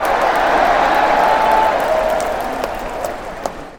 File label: baseball cheer